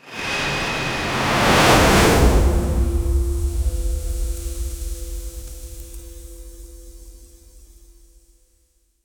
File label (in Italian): trasformazione di una kit, kitsune